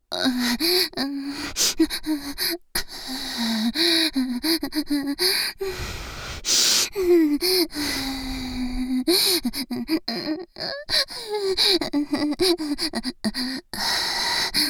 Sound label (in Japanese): マッサージフェラでお漏らし射精 喘ぎ, ASMR; ギャルフェラッ!!学校は援交ギャル風俗店ッ!!